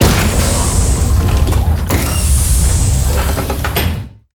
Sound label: Gear Locks Engage; moul